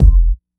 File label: puffy kick one shot f key; drum single hits one shots; kick drum samples